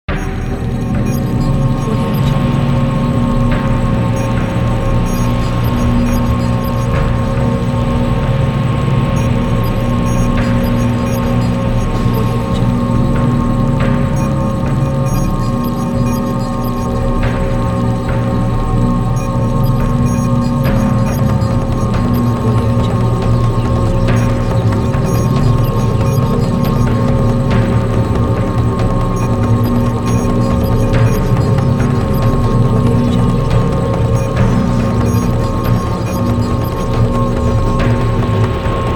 horror is eerie

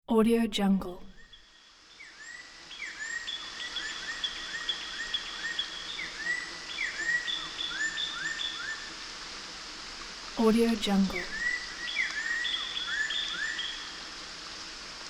summer forest ambience

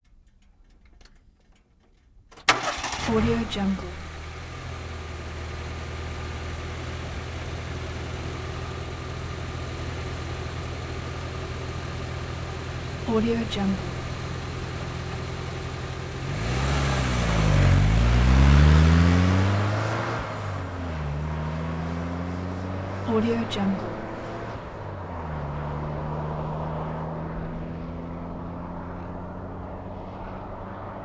jeep cherokee starts engine rumble; 1984; prosoundfx

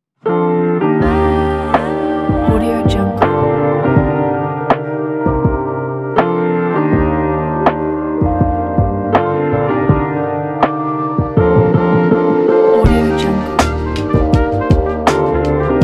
chill rainy lo fi hip hop

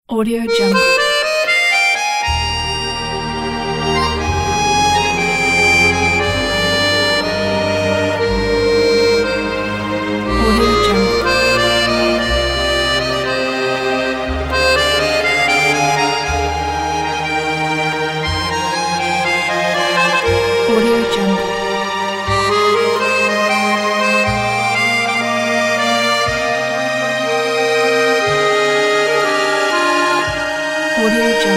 accordion and strings

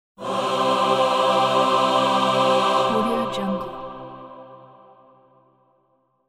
holy revival heavenly choir ah

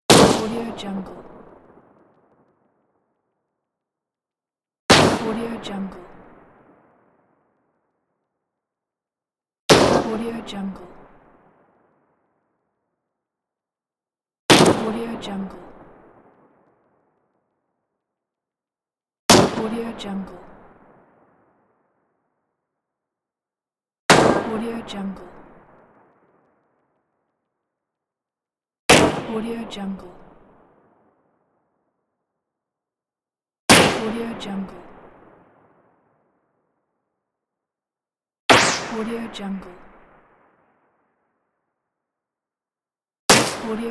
dirty scifi gunshots; lokohighman

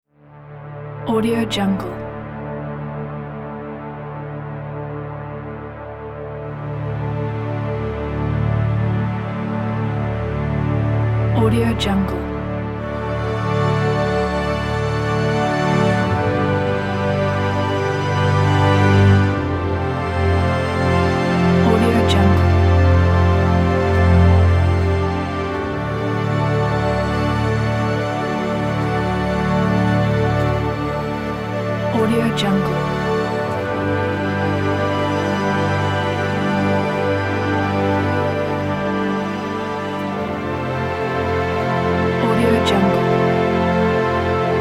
orchestral background

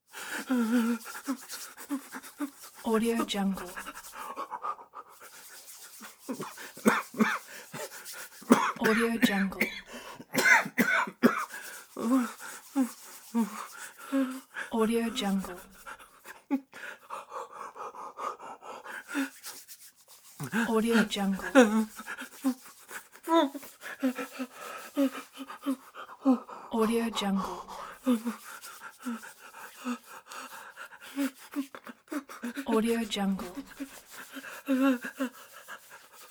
freezing man shivering coughing

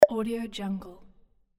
pop notification; monochromeeffect

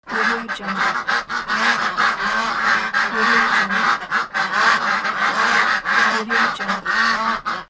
ducks; soberman

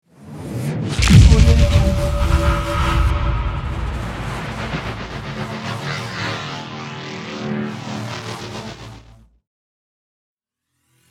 the cinematic woosh

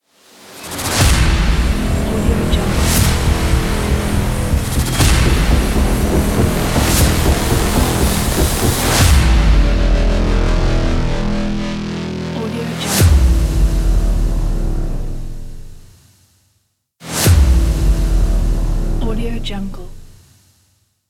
ident epic hit

